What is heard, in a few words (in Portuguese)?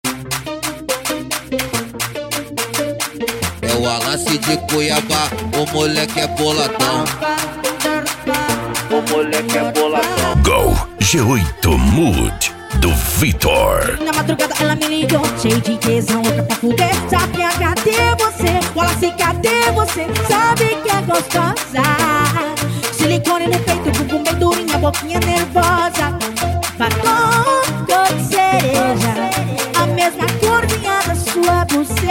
funk ritmado